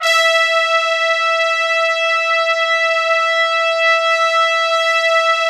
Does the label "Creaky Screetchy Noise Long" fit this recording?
No